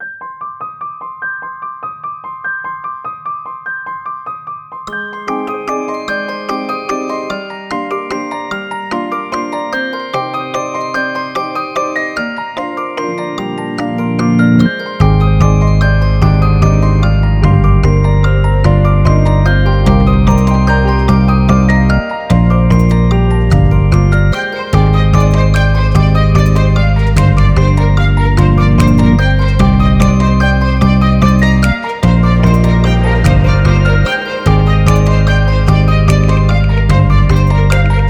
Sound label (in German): Trailer Musik